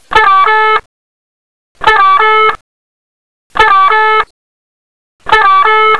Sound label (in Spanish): tono